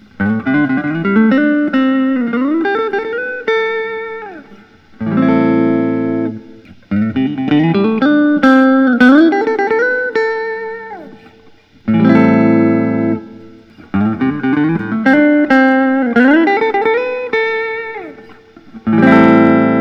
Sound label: parker df824 maxxfly; white